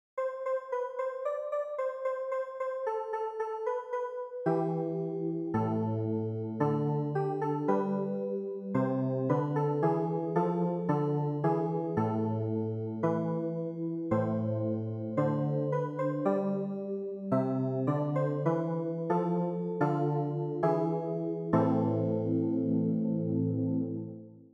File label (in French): doxologie solennelle clavier